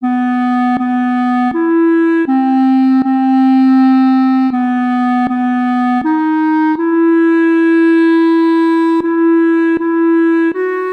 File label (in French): voix 2